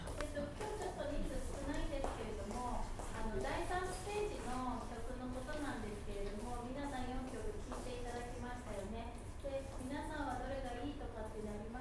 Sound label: Japanese songs